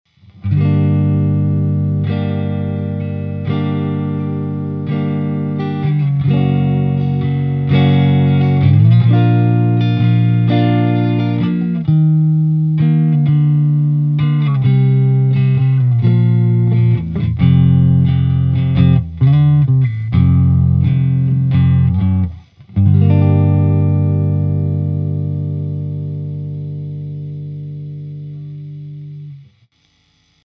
tele neck

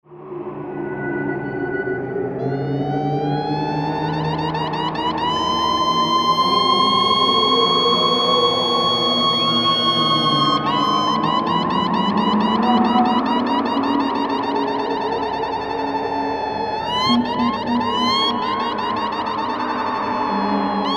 Echoes Seagulls; ECHOLTD

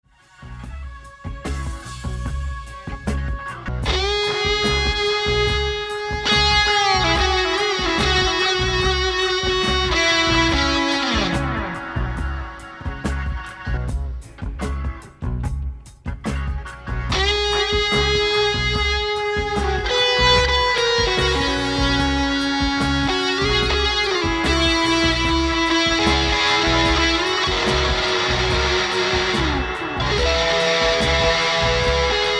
Echoes Fuzz; ECHOLTD 2° è un pedale echo prodotto dalla Cicognani Engineering e profondamente ispirato

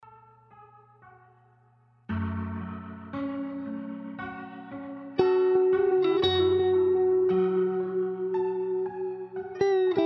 Echoes; ECHOFET

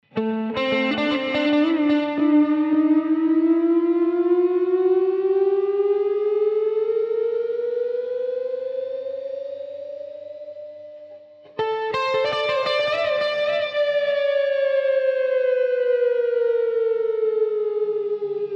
Ricochet long

